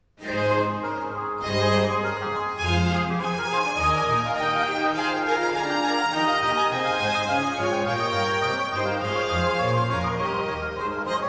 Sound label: Chorus; Halt im Gedachtnis Jesum Christ; Bach